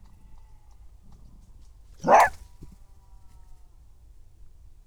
red fox vulpes vulpes; lisy

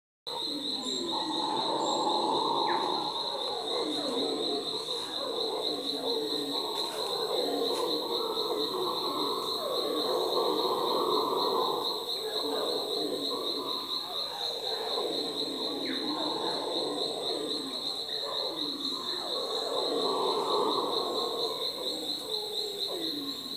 colombian red howler alouatta seniculus; revuna obezyany

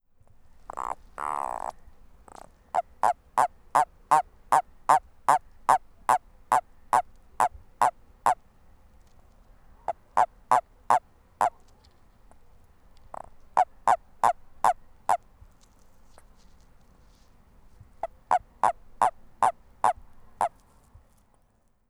spiny toad bufo spinosus; lyagushek i zhab

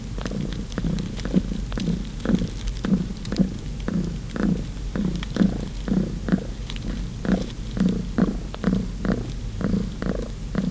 domestic cat felis catus, zvuki murchaniya koshek

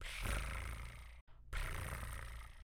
rumbling cat; murchaniya koshek

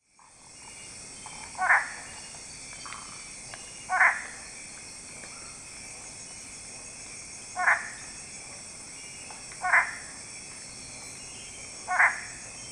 rocket treefrog boana lanciformis; lyagushek i zhab

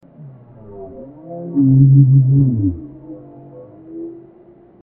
deaf fuzzy call of a bowhead whale

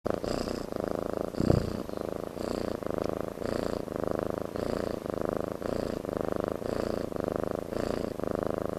maine coon cat purring; murchaniya koshek

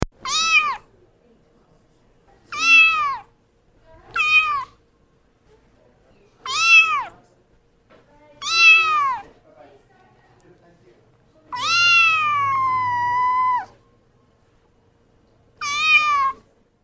kittens meowing - kittens meowing; zvuky kak myaukaet kotenok

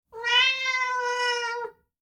animals cat blue meow; myaukanya koshki

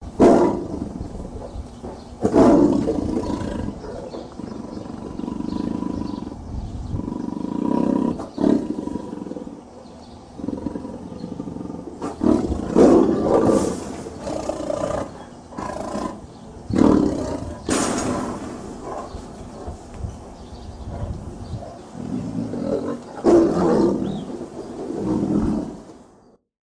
tigers calm roar; tigra

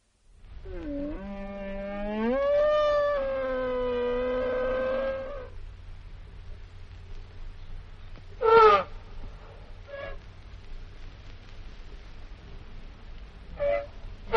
elephant trumpeting; bbc; slona

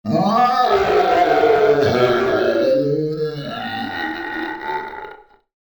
fierce tiger; tigra

